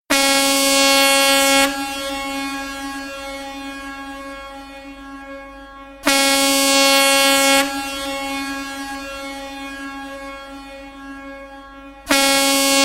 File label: Horn Ship Nokia X